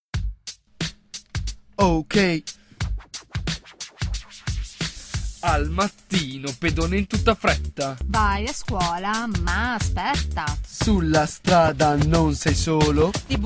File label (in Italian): Rap; Pedone; Eco-orienteering; A Piedi Sicuri; Trento Giovani